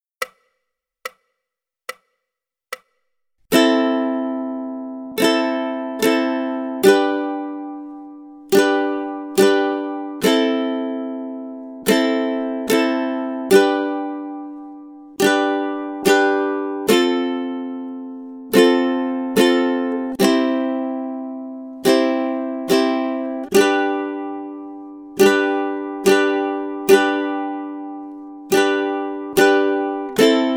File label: Rhythm Study, ukulele